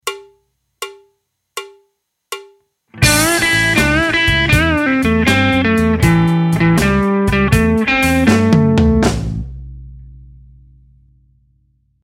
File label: blues